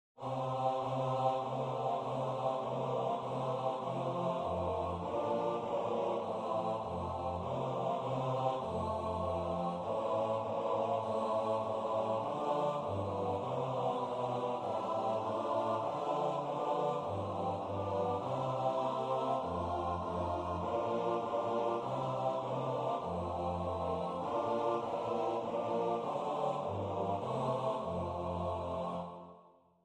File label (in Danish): Juletræet med sin pynt, bas